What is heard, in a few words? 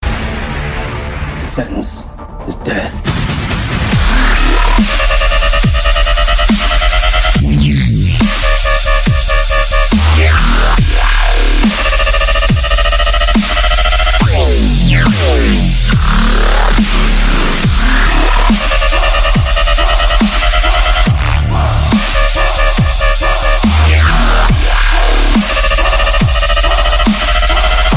Hardest Dubstep Drop